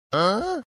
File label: ahhhh